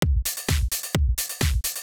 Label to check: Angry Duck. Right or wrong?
Wrong